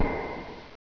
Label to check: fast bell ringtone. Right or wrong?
Wrong